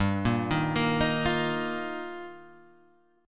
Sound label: G Minor 7th Sharp 5th G, Guitar Half Step Down